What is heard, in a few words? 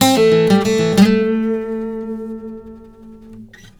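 baritone lick A, Blues Guitar; ILIO; Fretworks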